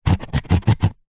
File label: foley cardboard scratch sharp object